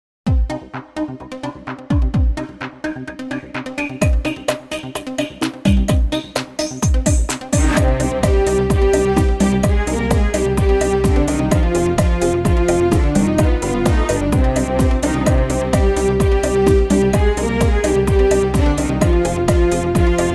CHA CHA

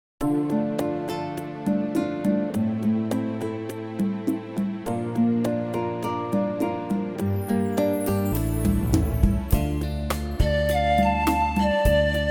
Rumba

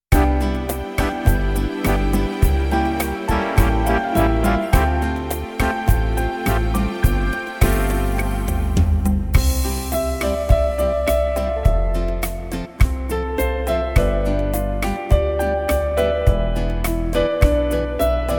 rumba

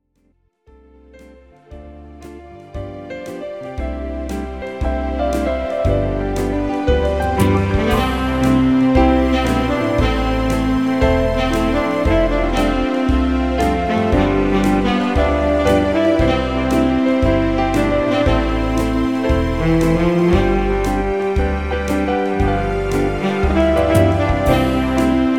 FOXTROT

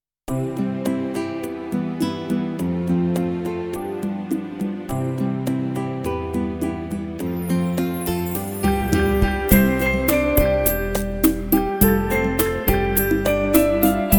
rumba, ballroom

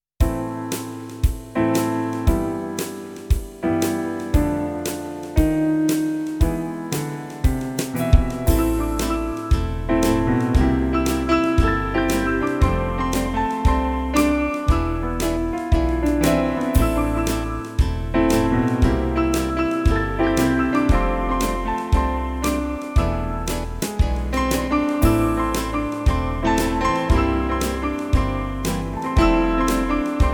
foxtrot, ballroom

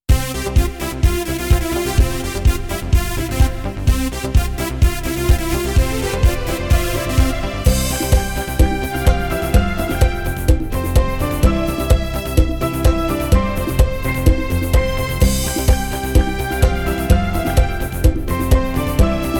cha cha

